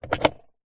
vehicles suv centre console compartment plastic lid nissan patrol 2019